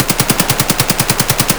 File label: akm close indoor loop; Escape from Tarkov